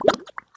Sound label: Mud Gulb; Zombie Catchers